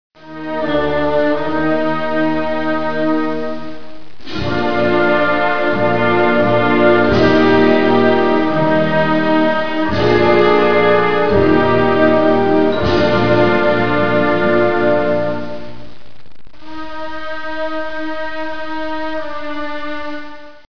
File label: Eb Tuner